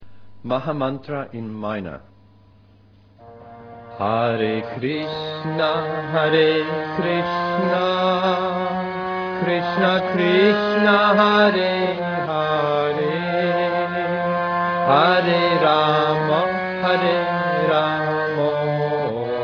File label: maha mantra in minor